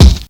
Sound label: kick; E-MU Formula 4000 Series Vol. 1; Hip Hop Nation; Bonus Perk